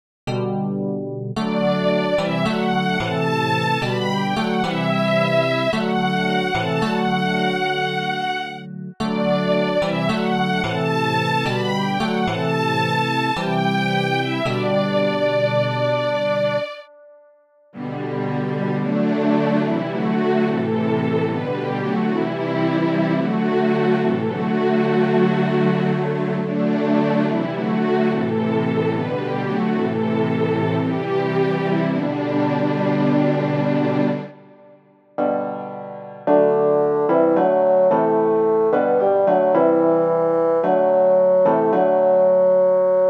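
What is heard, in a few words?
keyboard; jahreslosungen; losung 2020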